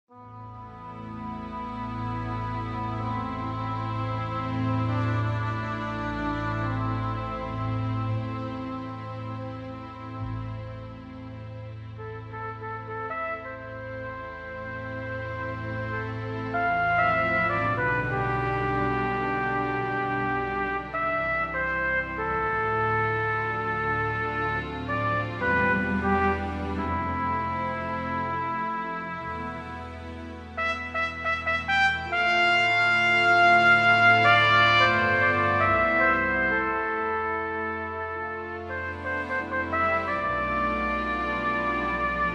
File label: Trumpet; bbc; Hasler's Trumpet